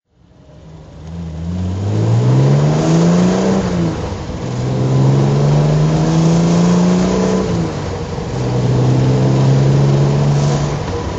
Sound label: tr250 tuned drive